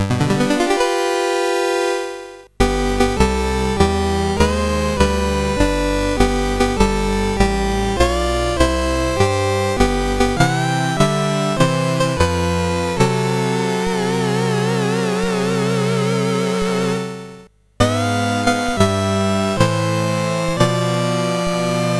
Happy Birthday CSG8580R5

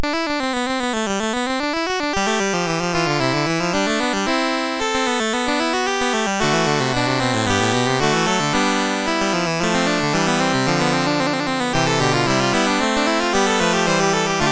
Fugue, MOS6581R3; Fugue VIII 6

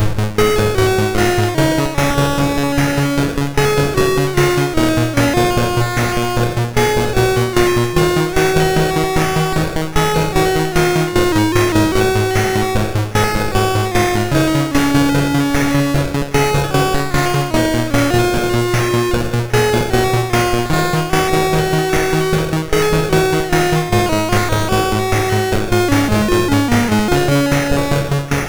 Synth Sample, MOS6581R3

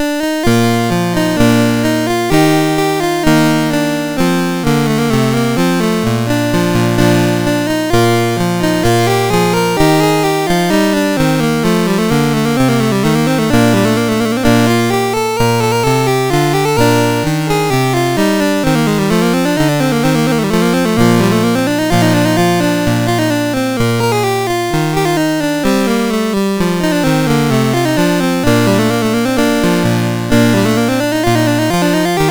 MOS6581; MOS6581R4

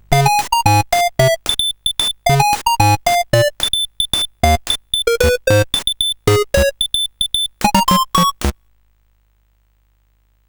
sid, CSG8580R5; Coco-Notes

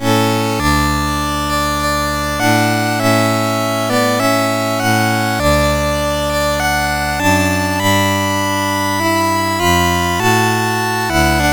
Auld Lang Syne, CSG8580; Bob Huffman

